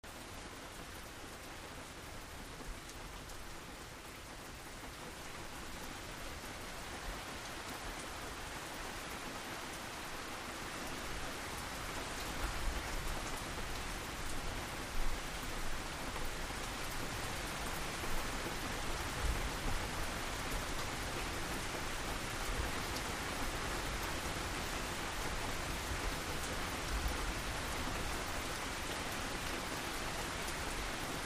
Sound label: Rain Medium Steady Ra, rain, drizzling